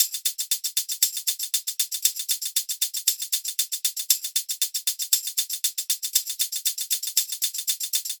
hat loop 117, Drums; Pop; Hat Loops; Slate; SD PASADENA Pop Sample Pack

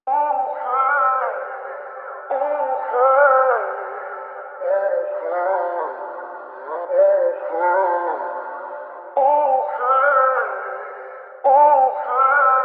vocal chop, 105, G; OhHey; Slate Digital Omnivox Vocal Sample Pack; Chops

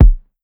Kick Punch, 808 Kicks; Slate; Vintage Drum Machines Volume 2 808 Edition

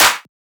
clap, One Shots, Claps; Drum Sample Pack; orange; Slate; SEISMIC

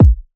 kick bricks, Hip-Hop; Drum One Shots; SD CAICOS Hip-Hop Sample Pack; Slate

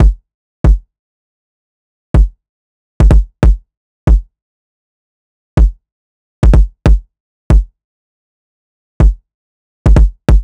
kick 140, Kick Loops; drum sample pack; Gamalama; SEISMIC; Slate

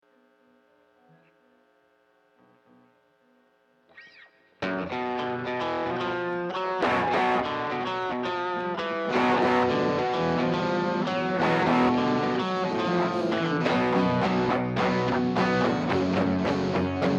Strings Mix

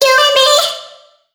phrase Dm, Vocal; Slate Digital Omnivox Vocal Sample Pack

